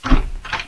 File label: door move; svencoop; aomdc; doors